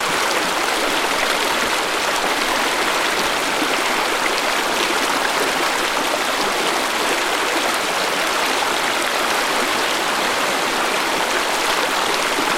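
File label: noise of a waterfall stream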